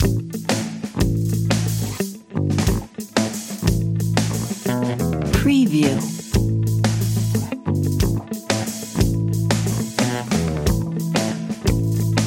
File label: atmospheric fusion funk